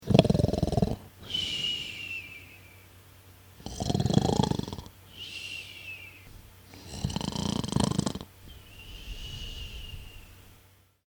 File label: Sleeping Man Snoring